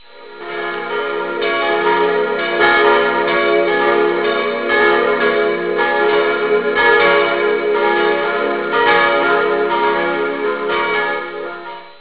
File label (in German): festgelaeute, glockensound; pfarre